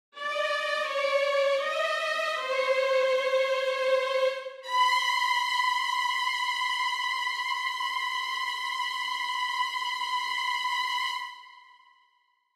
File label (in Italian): VIOLINI trylky